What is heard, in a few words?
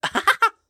Jump; Hollow Knight; Collector